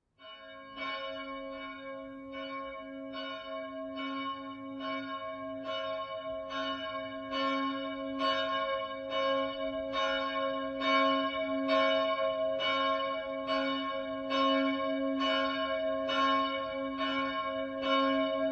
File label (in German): Glocke cis, Domglocken; Gabriel